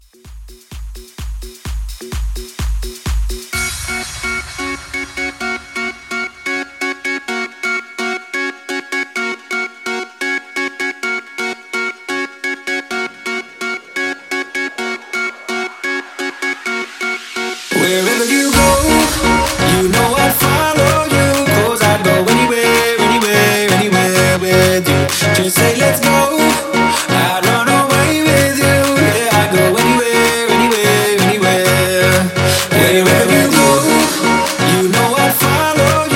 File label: Mashup